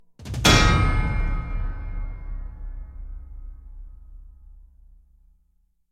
Anvil hits; SyrinScape; Iris hill manor

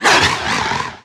roar

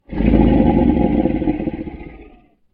Gold Dragon big roar; SyrinScape; Vault of Dragons

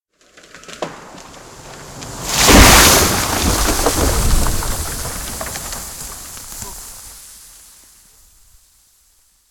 Treefall heavy and great; SyrinScape

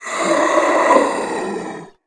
scrm; The Elder Scrolls III - Morrowind

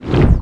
altr FAIL, magic; The Elder Scrolls III - Morrowind; Morrowind GOTY GOG

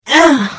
Hit; The Elder Scrolls III - Morrowind

